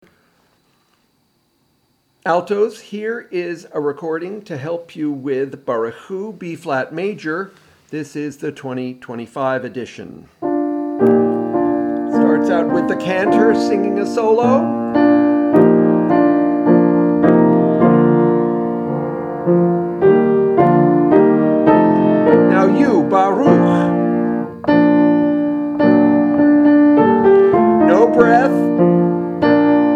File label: B flat major alto; Barchu